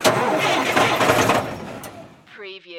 Semi Truck Start Engine; sound effects bible

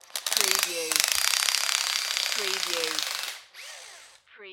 Cordless Drill Tight

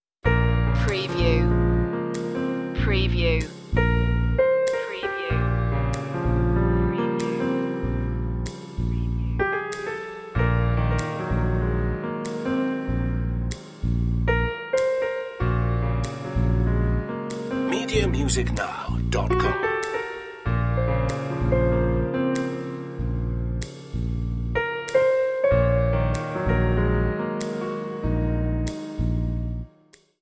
piano slow swing

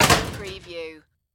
Locker Door Slam Shut

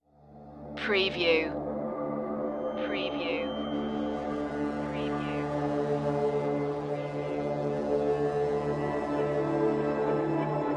creepy hollow

